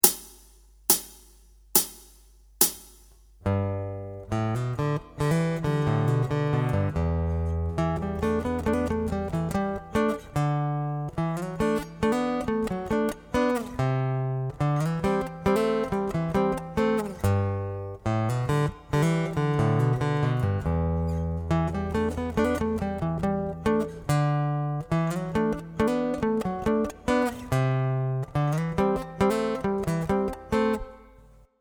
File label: Rhythm Riff